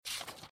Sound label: drag